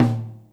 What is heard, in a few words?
tom, Drums Acoustic; USB Soundscan vol.10; AKAI; 90s sample CD; DYNAKIT 2